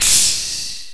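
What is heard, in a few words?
VOX STEAM CYMBAL; RAP CITY 1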